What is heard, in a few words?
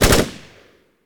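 m 16 exterior shot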